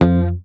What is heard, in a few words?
Bass, MUTES